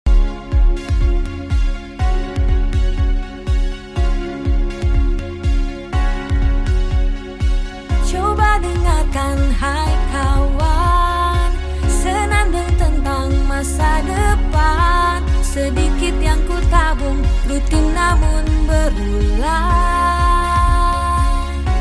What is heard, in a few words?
JINGLE Sound